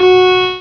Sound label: attack countdown